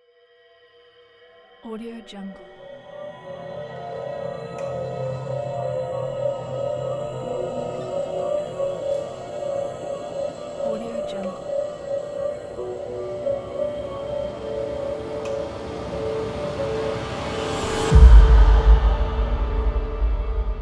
halloween ident

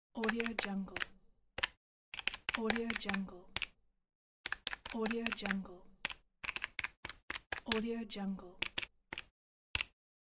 electronic calculator working

